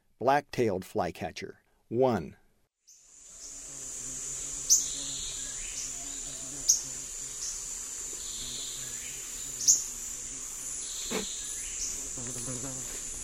Black-tailed Flycatcher; S. America; Eastern Lowlands

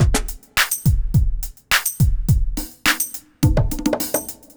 eastcoast shuffle 105

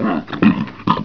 PIG, animal; 动物

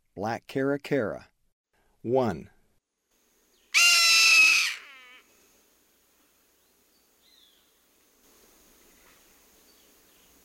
Black Caracara, Birds of Ecuador; S. America

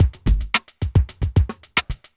evil beats 110

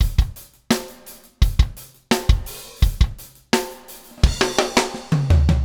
Metal Groove Beat, Rock Drums

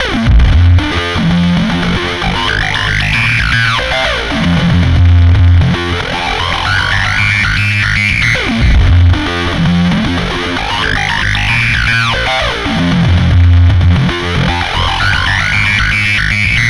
Guitar, Rock

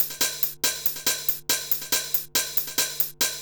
ACID TECHNO

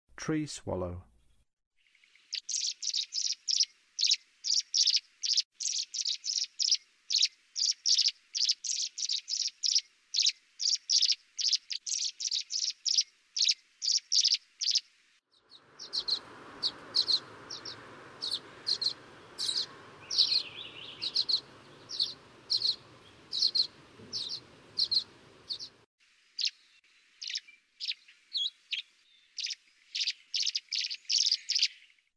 Tree Swallow, Songs of Birds of North America; Western USA; Western USA Songbirds

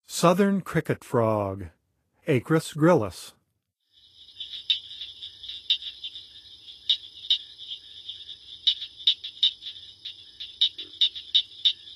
Southern Cricket Frog; North America; Songs of Frogs & Toads of North America

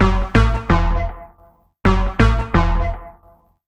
Synth, Techno

